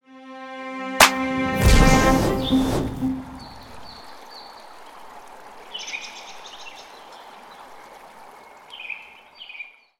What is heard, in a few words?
casual intro